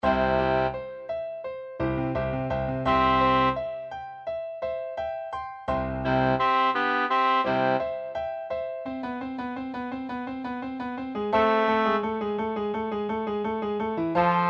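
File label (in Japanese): Bas, 合唱曲のパートごとの練習用音取音源; BWV71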